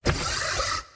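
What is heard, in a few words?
construction progress, ui; Stellaris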